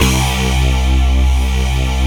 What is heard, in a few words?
SYNCMAT C2; Best Service Dream Experience